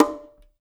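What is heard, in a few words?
bongo; Spectrasonics; Liquid Grooves 1 & 2; CONGAS+BGOS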